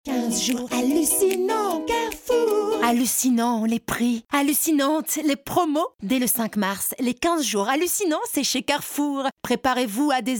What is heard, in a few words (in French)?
Jingle chante comedie; Carrefour